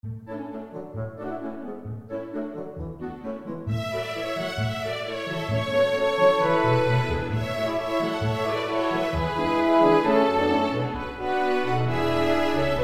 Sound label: chamber orchestra